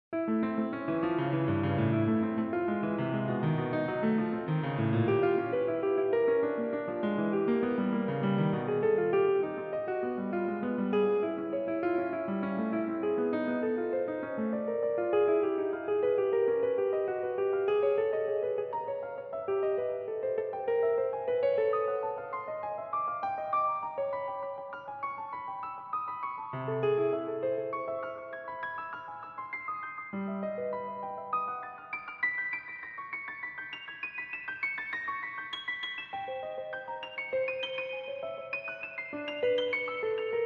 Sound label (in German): klavier; Algorithmische Kompositionen